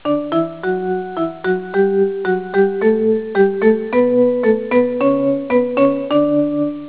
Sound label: shepard; illusion